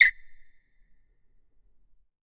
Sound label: Basketball Court